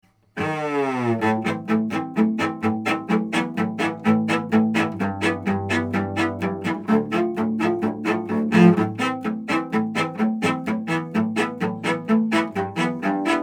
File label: Soyuz 013 FET Cello